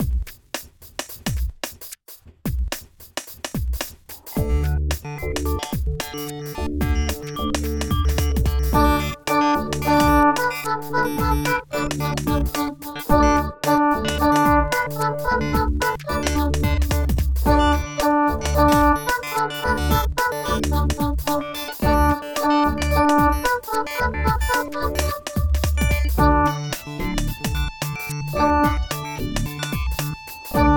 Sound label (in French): saxophone; mib